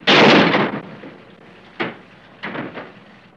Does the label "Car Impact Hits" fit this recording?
Yes